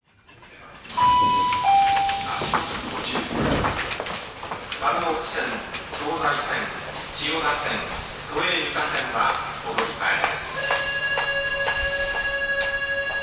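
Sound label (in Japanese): 走行音, 5000系; 大手町→神保町; Tokyu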